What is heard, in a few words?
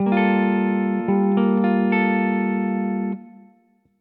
Gmin9, chords jazz